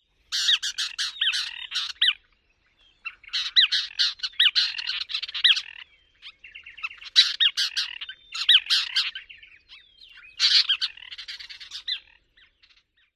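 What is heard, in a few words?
interaction calls, Scissor-tailed Flycatcher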